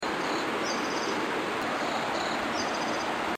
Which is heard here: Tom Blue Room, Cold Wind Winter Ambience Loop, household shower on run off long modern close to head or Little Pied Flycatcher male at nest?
Little Pied Flycatcher male at nest